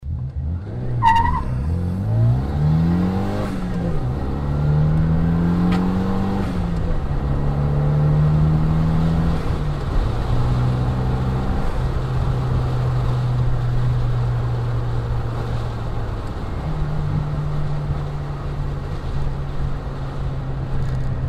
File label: copen open moving, muffler; car